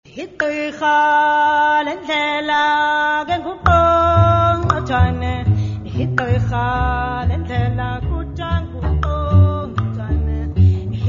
Click Song

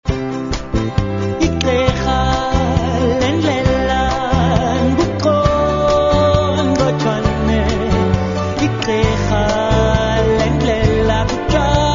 Click Song

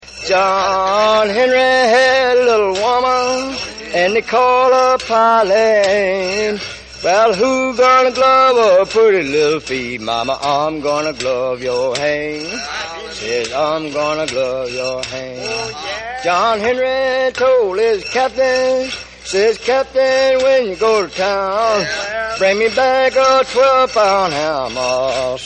Guitar; JohnHenry

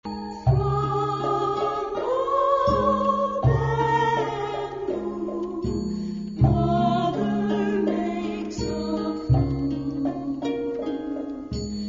Bamboo Flute

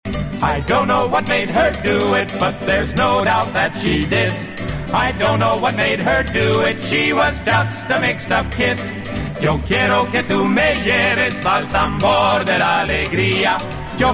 Tamborito

